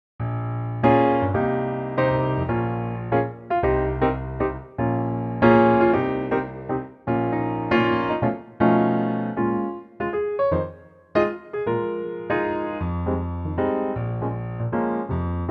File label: Bbm piano; 3/4 Pianotrak; key - Bbm - vocal range - F to F